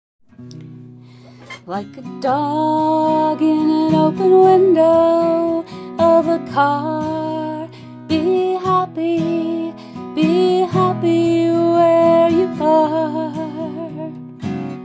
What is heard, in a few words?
chant, guitar